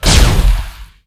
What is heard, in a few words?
plasma cutter